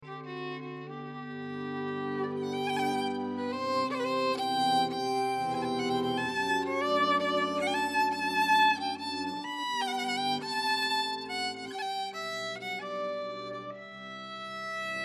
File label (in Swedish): Polska